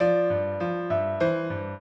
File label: piano; Mozart